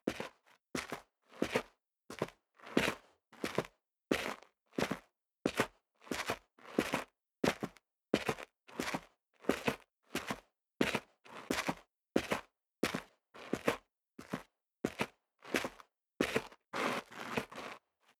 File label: step sneaker concrete grit walk sequence; schritte